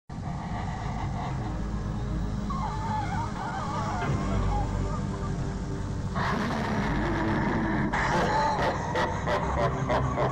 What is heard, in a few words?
darkness s laugh; legend 1985